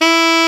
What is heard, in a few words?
sax alto ff E, Short